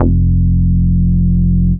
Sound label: CHASE BASS; 90bpm; Demo Multitracks